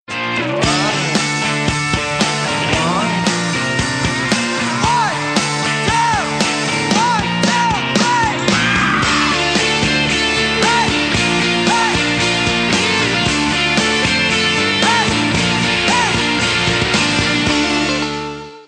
Sonnerie

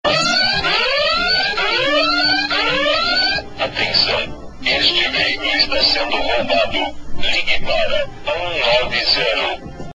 Alarme

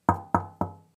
Toc toc toc miroir

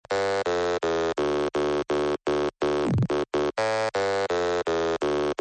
Sonnerie message

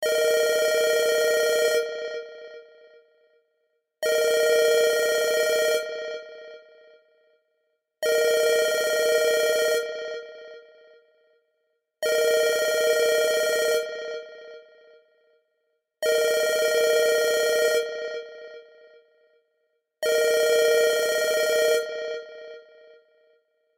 Bleep Vieux mobile

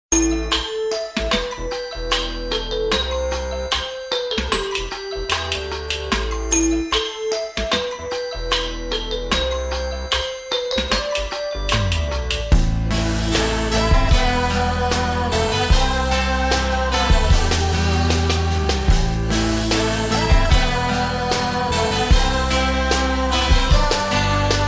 Melodie

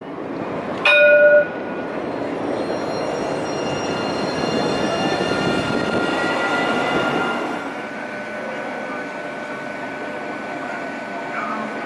TRAM TOURS REVEIL

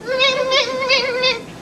papa rire

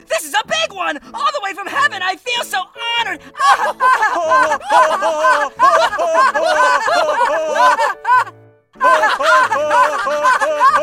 orgasme

